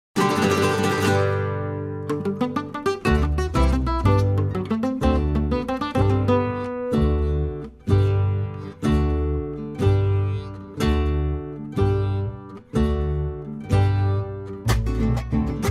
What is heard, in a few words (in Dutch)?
instrumentaal